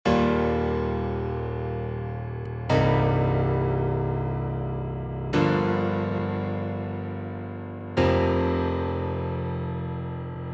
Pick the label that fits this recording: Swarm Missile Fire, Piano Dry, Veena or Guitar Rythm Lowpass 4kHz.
Piano Dry